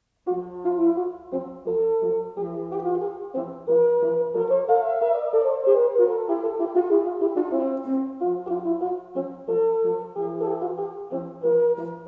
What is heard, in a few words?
Duos for 2 Horns Polonaise